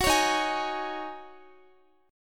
D sharp Minor 11th D sharp Guitar, chord; strummed; JGuitar

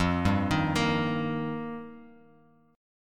F 7th Suspended 2nd Suspended 4th, Bass Standard; F7sus2sus4; arpeggiated; JGuitar

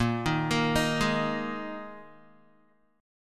Bb 7th Suspended 4th Bb Guitar 4E,4A,5D,5G,5C,6F

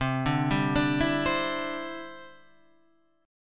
D 9th Suspended 4th C

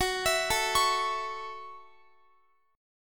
Gb Minor 7th, Guitar; Gbm7; arpeggiated; x,x,18,21,21,21

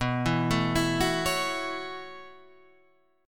B 9th Suspended 4th B down Guitar Drop D

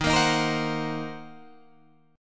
Eb Minor 6th, Guitar DADGAD; Ebm6 strummed

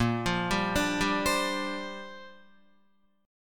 Bb 6th Add 9th Bb Guitar; Bb6add9 arpeggiated

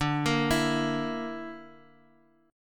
D Suspended 2nd Sharp 5th, Guitar, Open D

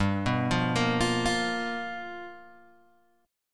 G 7th Sharp 9th G chord, Guitar Open G

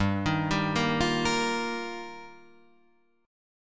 G Minor 7th Sharp 5th, Guitar, Standard; Gm7#5; arpeggiated; JGuitar; 3,6,5,3,6,6

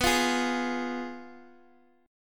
F Diminished B

